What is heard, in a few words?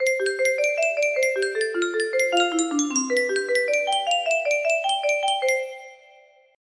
seoul metro train approaching melody, music box melody